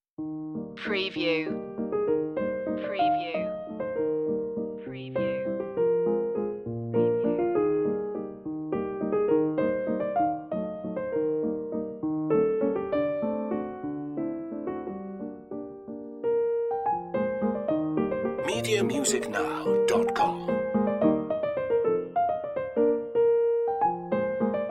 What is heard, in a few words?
waltz piano; tchaikovsky